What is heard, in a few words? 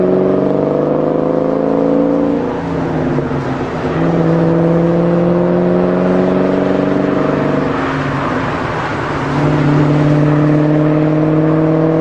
Mustang Cobra